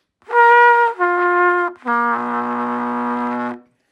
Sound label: trumpet